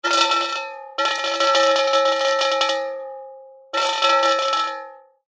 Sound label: nature water drips short metal resonate ring hollow